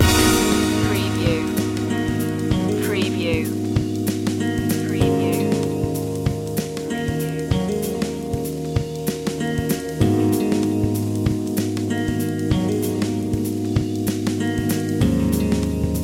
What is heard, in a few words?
cool check loop